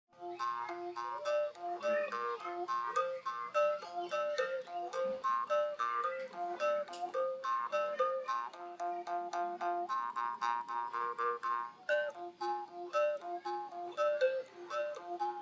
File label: Black Lahu mouth harp, bamboo mouth harp solo the player uses two instruments simultaneously